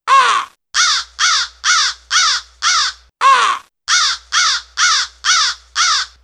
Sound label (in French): grand corbeau; faune